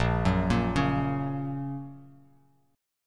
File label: Bb Minor Sharp 5th, Bass Standard, Bbm#5 chord; arpeggiated; JGuitar